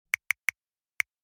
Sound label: Smartphone texting style short